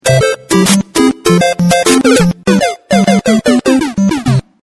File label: power mid